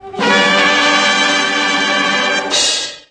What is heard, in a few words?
chord in c